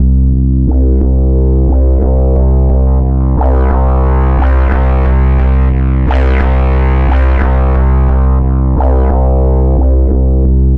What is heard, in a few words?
178 step sweeper, bass loops